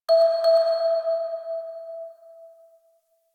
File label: Mallet; Warframe; Ambience; Clan Dojo; Dojo Randoms